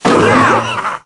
battle ram deploy

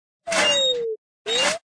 Emote Surprise Prince

